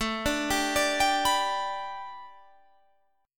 A 7th Suspended 2nd Suspended 4th Guitar Half Step Down